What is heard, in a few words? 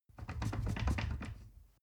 ladder wood rattling